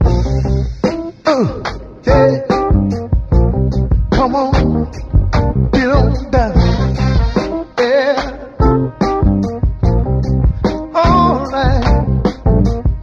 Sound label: full uh come on get on down yeah all night, Loops Phonky